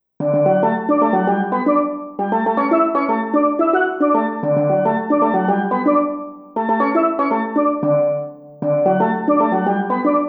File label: steel drums